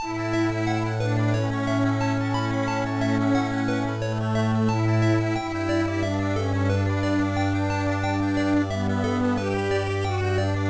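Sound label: background music; card e reader 2001 gba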